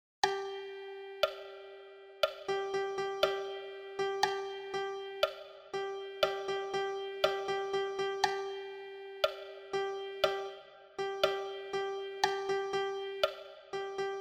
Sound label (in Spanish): percusion